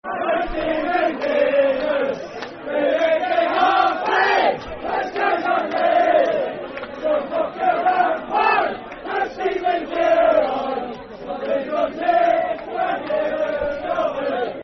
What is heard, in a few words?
Football Chant (Soccer Song), N. Ireland; My Steven Davis; N. Ireland N.i.f.a